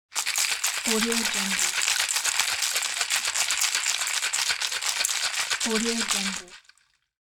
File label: cocktail shaker; applehillstudios